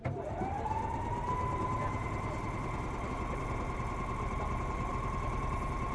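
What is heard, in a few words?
compressor start, Train; LT1938; OpenBVE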